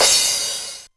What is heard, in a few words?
909 Crash, Novation Drumstation; Novation Drumstation V1